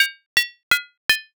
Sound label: chain